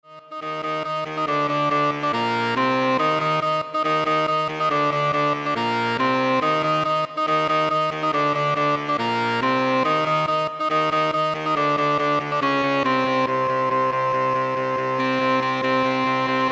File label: Sax Choir